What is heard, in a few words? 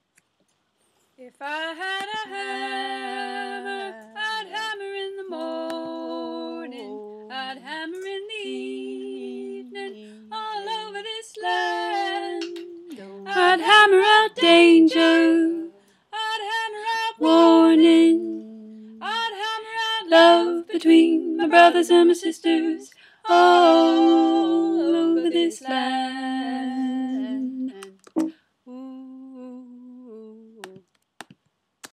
upper accompaniment